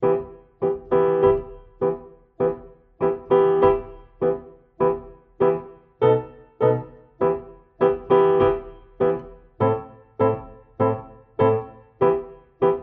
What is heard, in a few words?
Tango